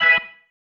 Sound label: Stab One Shots, Organ